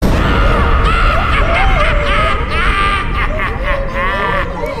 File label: Evil Laugh